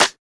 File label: Snares